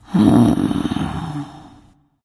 confused; freya

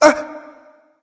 nv breath; smite; voicepack; xbalanque

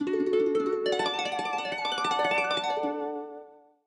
victory lyre; smite; Apollo

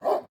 defeat bigdog bark; smite; god daji skin15

